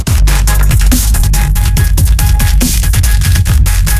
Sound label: dubstep; musicgen